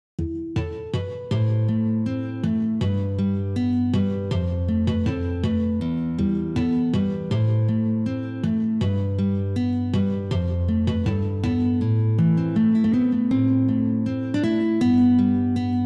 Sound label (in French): ballade Irlandaise